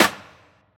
verby snare single hit E key, Royality free snare drum sound tuned to the E note; snare drum samples